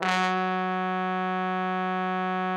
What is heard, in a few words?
TROMBONE; Best Service